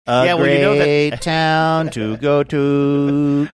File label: singing a great town to go to